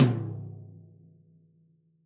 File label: Tom Stick Hit Hard Dry Session; Drums